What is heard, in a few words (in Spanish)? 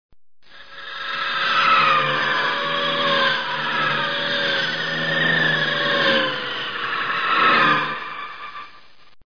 sonido fondo